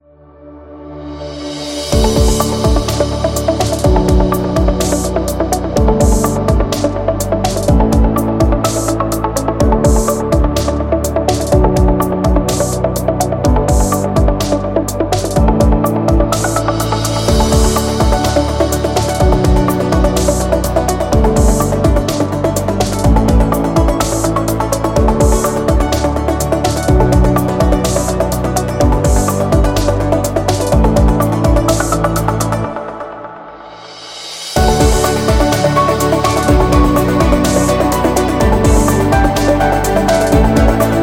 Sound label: Innovation Corporate; inspirational motivational